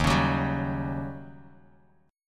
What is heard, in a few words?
D sharp 7th Sharp 9th D sharp Bass Standard; strummed; D#7#9; 11,16,16,12; JGuitar